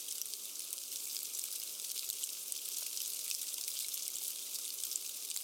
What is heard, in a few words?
ice drive change